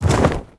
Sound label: pennant pickup, inventory; ui